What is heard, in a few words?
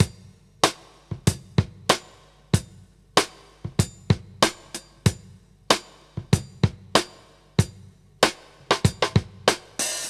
Todd 095; Zero-G; Monster Beats